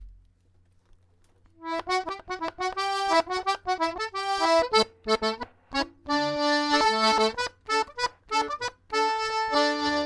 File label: Ponytrot Polka - normal